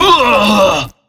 HUMAN choke Male

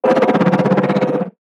HAMMERHEAD idle

